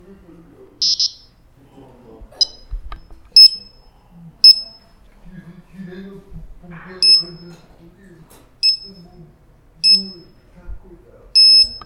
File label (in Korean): 삑-삑-